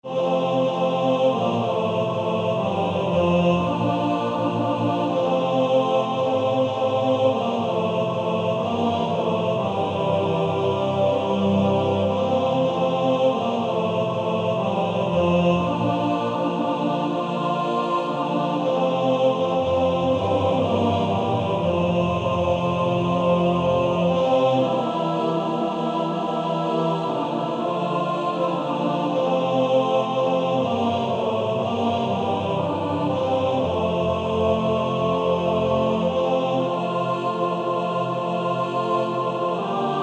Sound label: Male Choir TTBB; He Hideth My Soul